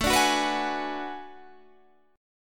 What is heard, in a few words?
B Minor 6th A; Bm6/A strummed